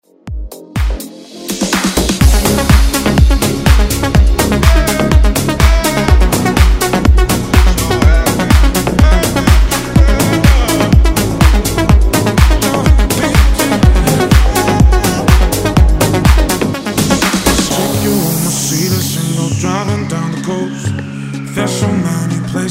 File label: Dance